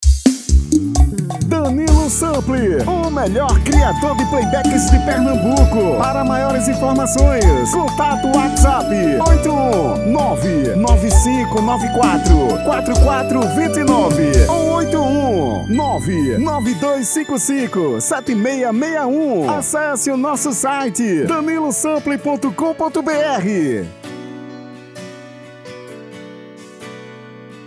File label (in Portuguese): Arrocha